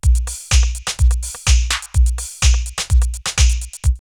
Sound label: Snap Attack 126, drumloops, Rave; X-Static Goldmine; 90 s Sample CDs